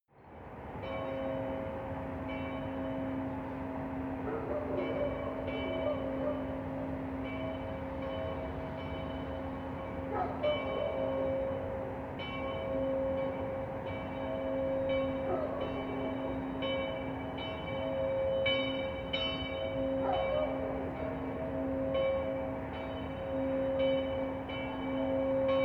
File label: suburb nightfall church bell